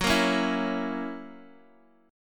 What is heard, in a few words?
F sharp 7th F sharp Guitar Open D